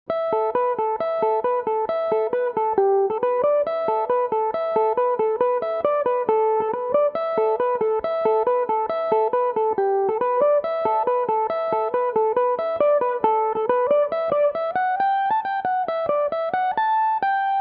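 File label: polka A dorian, Irish mandolin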